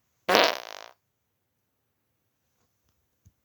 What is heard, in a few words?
fart; polish